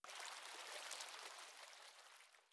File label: Drinking Fountain; Rabbids Multiverse